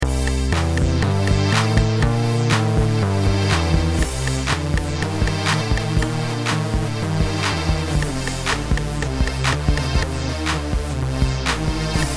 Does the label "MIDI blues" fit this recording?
Yes